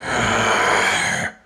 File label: idle, male zombie; nmrih; zerosiki; slender